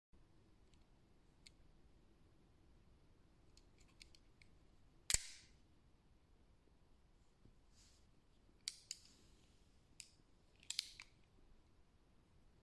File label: flick knife; چاقو ضامن دار